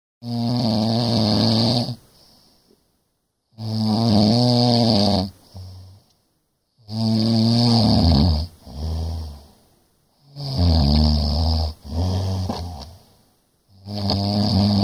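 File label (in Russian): zvuk gromkogo hrapa, loud snoring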